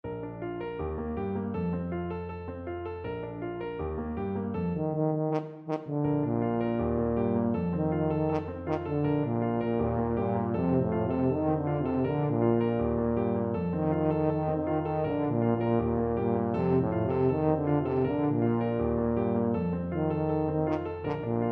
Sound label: la bamba, tuba